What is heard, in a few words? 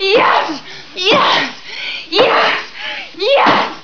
bed